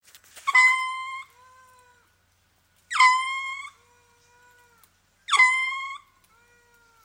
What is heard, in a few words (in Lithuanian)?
Katinis lemuras